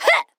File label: Grunt Attack; Evie; Troublemaker; Paladins